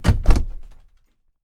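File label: ST DOOR OPEN; 1000fx; Doors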